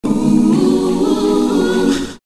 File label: "ooh" group sung; ooh ahh